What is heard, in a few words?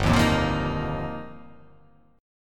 G Minor 7th Sharp 5th; Gm7#5; strummed; JGuitar; 3,6,3,3,5,5,5,x